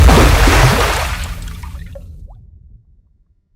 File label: Explosion hit water; Hovl Studio; SFX Battle sounds; WhiteMan Unity2D